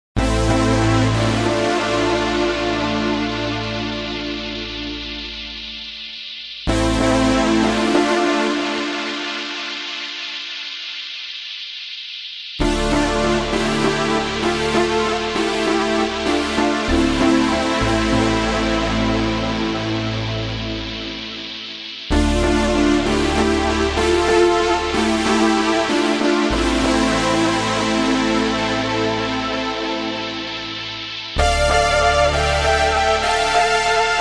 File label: rain synth